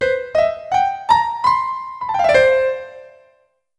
Parl Music

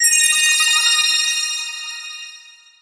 magic

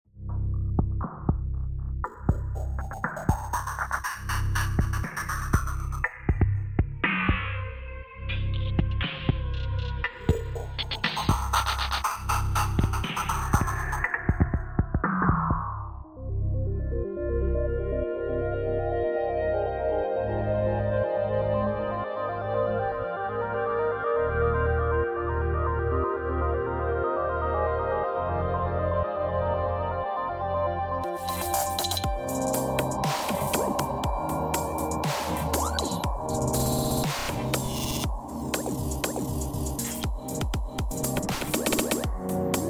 Hellish Atmosphere; Terraria; Split Mod

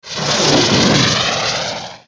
Boost; F.A.M.P.S

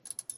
Light Flicker; The Joy of Creation Halloween

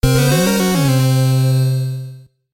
Checkpoint; Cotm; Sydney Hunter and the Curse of the Mayan

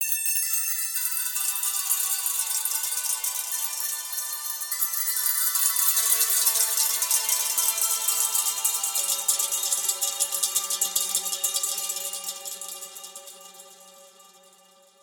Forest Dulcimer

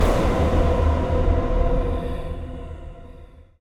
Hi Hit

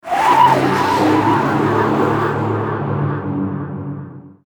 Scary wind; F.A.M.P.S

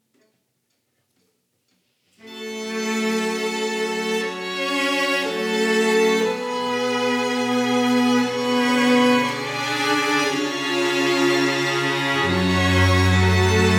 String Quartet Version; Sheepy: A Short Adventure

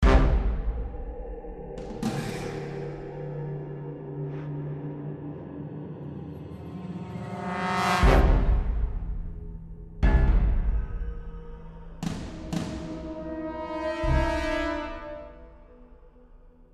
Tension; Paris

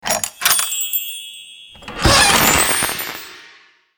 Piggate Open; World Piggate Open

